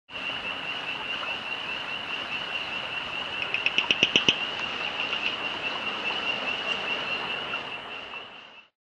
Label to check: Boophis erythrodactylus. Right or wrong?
Right